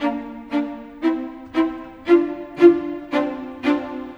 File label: Strings; Sonic Foundry (Sony Creative Software); Orchestral Series VOL-4; Rock and Pop; Rock-Pop 02